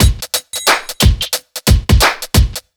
90 BEAT, Pure Electro; USB Soundscan vol.03; AKAI

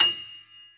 PF STB F 0, GRAND PF

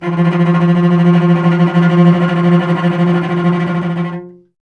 STR VC F 2, Cello; Miroslav Vitous; Solo Instruments VOL-1

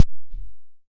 Kick - Gate Rev Dull; Tama TS-206

